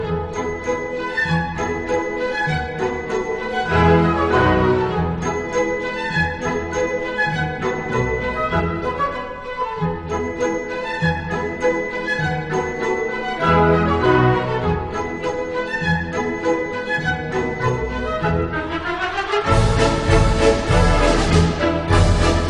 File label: Polka